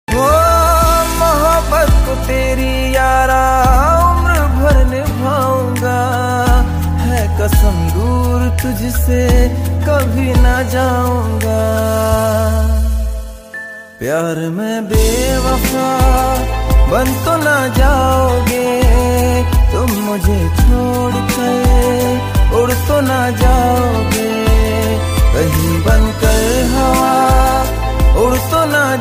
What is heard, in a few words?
Sad Song Ringtone